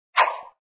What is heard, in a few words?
Swish; thebestdayever